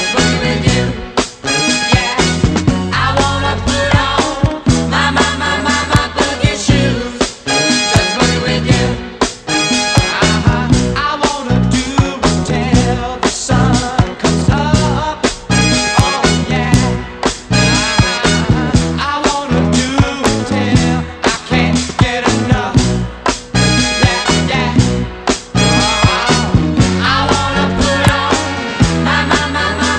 disco

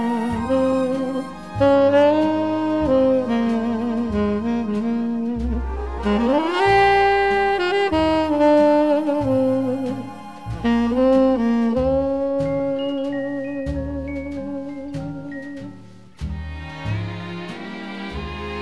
jazz

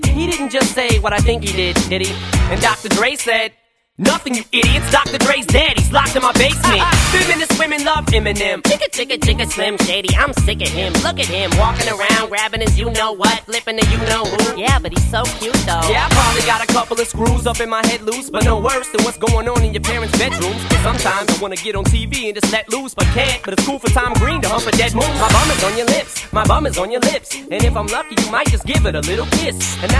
hiphop

